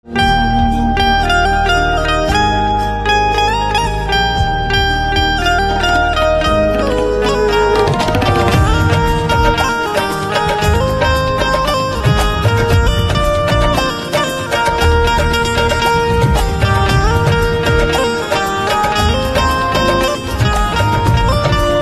Banjo Instrumental Ringtone

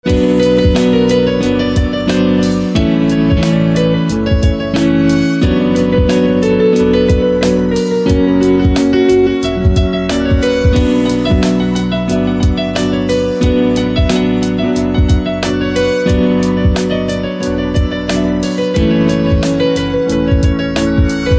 Piano Instrumental Ringtone; punjabi instrumental ringtone

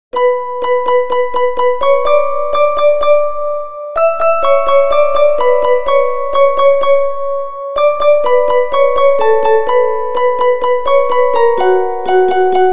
Alarm Tone

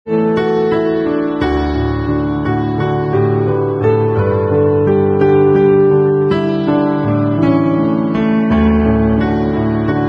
Piano Instrumental Ringtone; punjabi instrumental ringtone

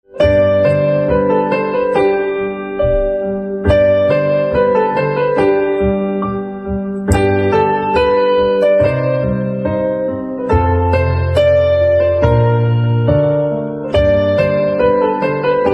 Piano Instrumental Ringtone

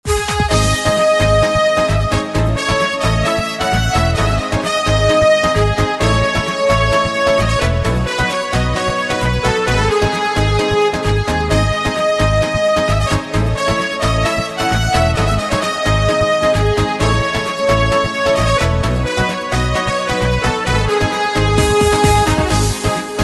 Saxophone Instrumental Ringtone

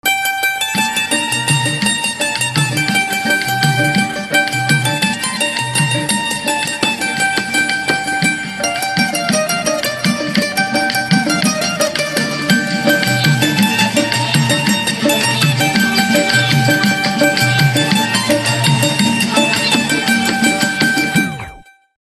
Sitar Instrumental Ringtone

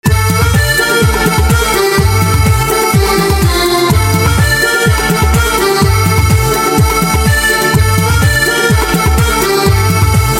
Accordion Instrumental Ringtone

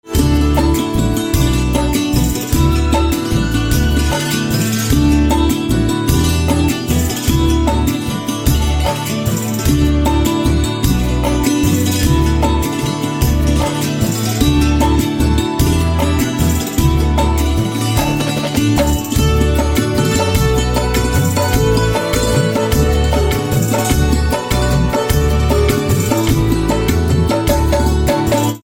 Santoor Instrumental Ringtone

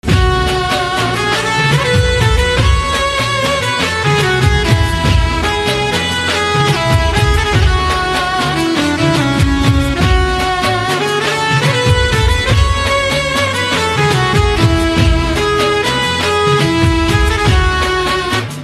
Saxophone Ringtone

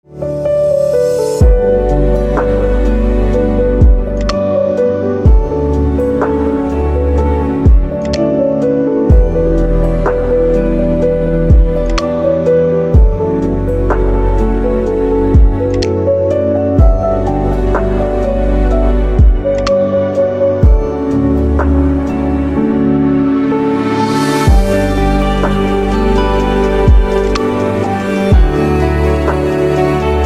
Piano Instrumental Ringtone

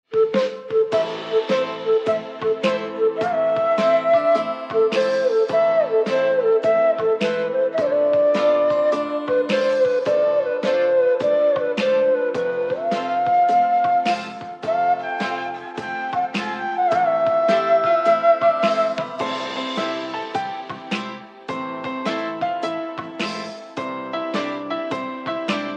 Flute Instrumental Ringtone

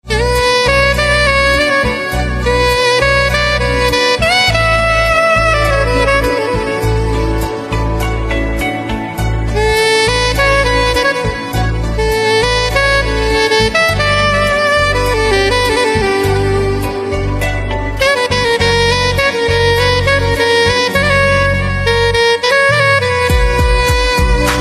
Instrumental Ringtone, saxophone

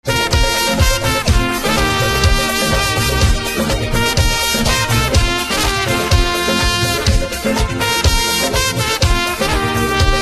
Saxophone Instrumental Ringtone

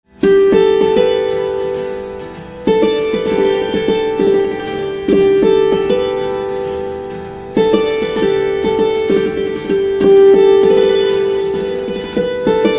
Santoor Instrumental Ringtone; punjabi instrumental ringtone

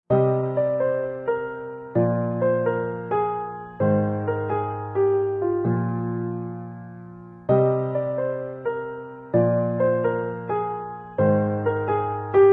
Piano Instrumental Ringtone

